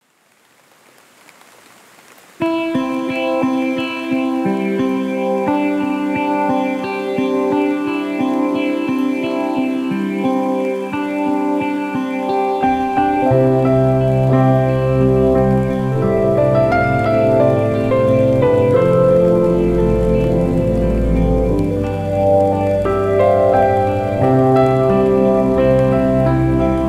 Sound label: rain lofi